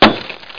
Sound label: bang; dosgames; brr